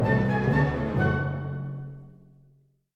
Music Dialogue Dramatic End 64bpm

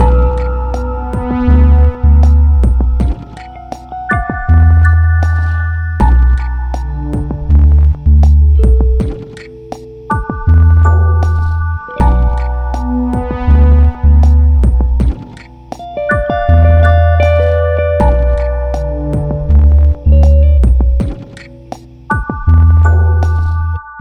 stem outro; Pix the Cat

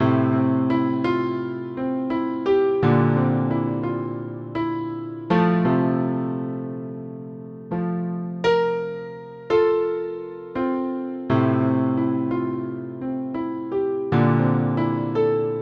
Loop Nofade Nobase; King Lucas

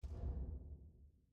Moving Platform Bump; City SFX

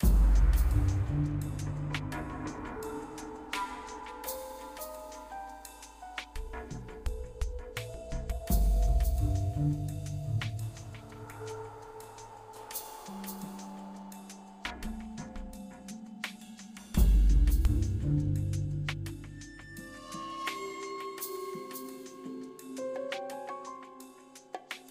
Music Stealth; Spider-Man 2 The Game 2004 PC

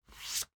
Slide Out; Carter Competition

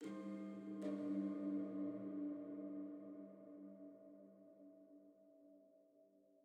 Env Field Night Cave; the legend of zelda tears of the kingdom

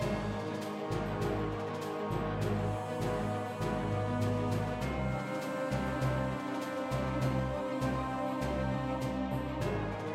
music boss; Sentry Knight Tactics

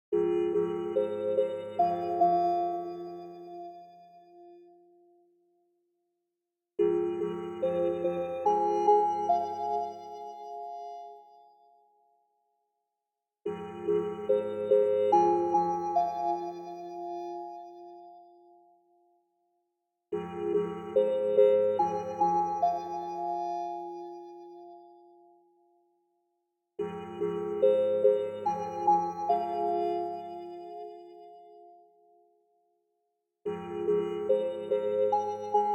Combat Synth Stem; Combat LSD Synth Stem; Necropolis

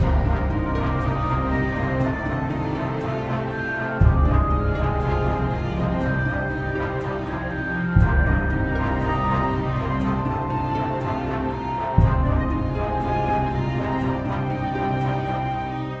Fanfare end loop; Infinite Adventures

